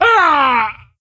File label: Wound Crit; Ley Guardian Eregos